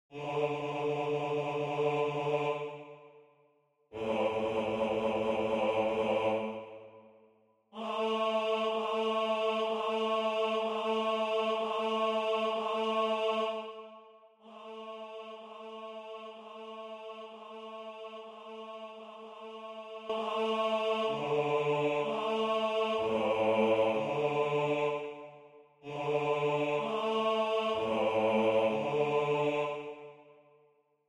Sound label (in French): Basse; Antonio Vivaldi; Gloria